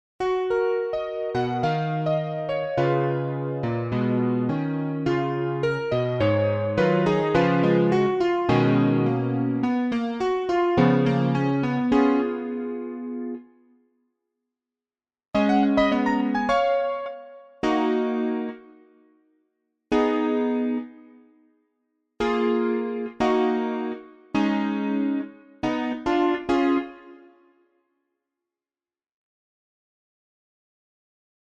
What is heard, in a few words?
elec piano